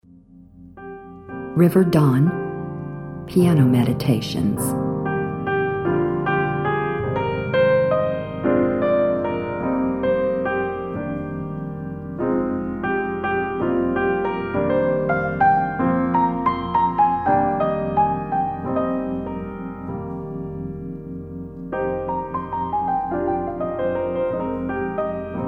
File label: piano meditations